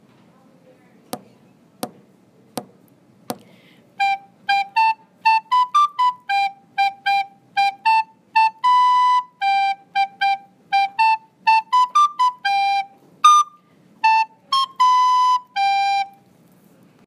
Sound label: pop goes the weasel